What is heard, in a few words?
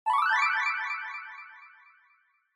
Scene Change Delay Fast